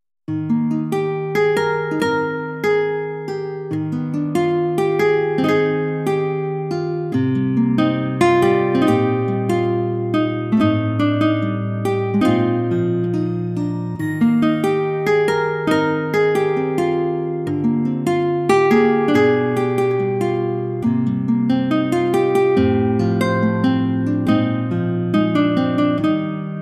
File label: bgm; yourdiary